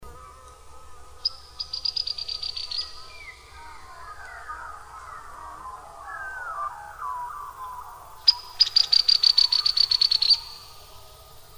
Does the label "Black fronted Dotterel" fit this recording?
Yes